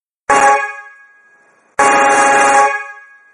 son 3 trompes acier petit modele superposees

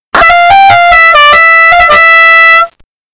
son feria original super

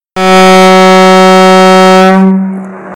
trompe acier 100cm pavillon rond

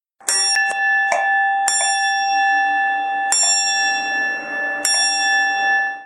cloche tramway

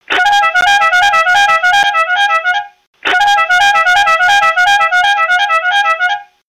son le tunning, klaxon

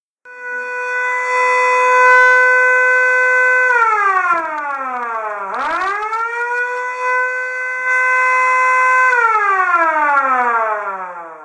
son sirene us army turbine

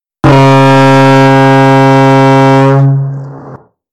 trompe acier 74 cm valve manuelle; camion; mdk m

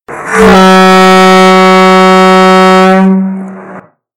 son trompe acier 64 cm pavillon rond; camion; trompe acier n 2bis

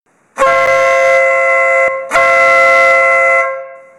son le nautilus, klaxon

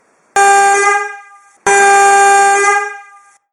son jerico, camion

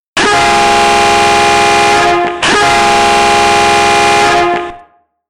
son trompe acier, klaxon; tgv en 2 en 1

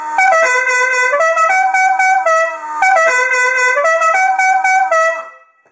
son trompe noire, klaxon; general lee

